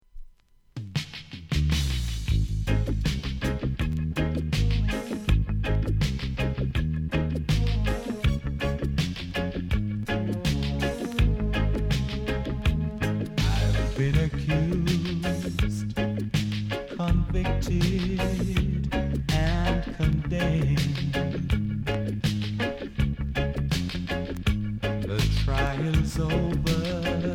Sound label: REGGAE